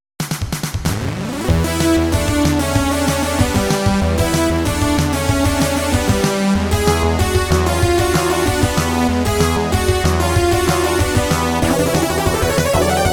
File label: battle